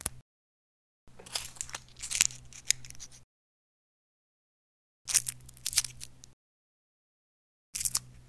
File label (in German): Blatt